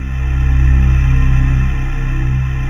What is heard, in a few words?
CHORAL, Synthesizers